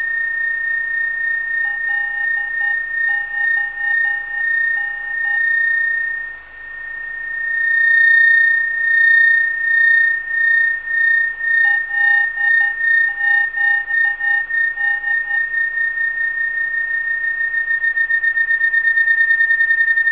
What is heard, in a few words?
takeoff heathrow 09R; hamaerodopp